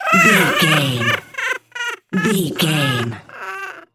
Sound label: goblin fairy cry low